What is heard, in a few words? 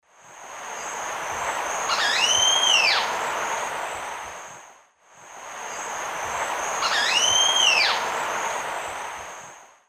Cotinga maynana, call male; S. America; Eastern Lowlands